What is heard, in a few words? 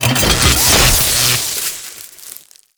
Fortress Electricity Turret Deploy; Ambience; Warframe; Grineer Fortress